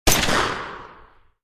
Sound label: Pistol automatic 22mm single shot